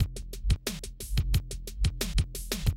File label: Classic DrumLoops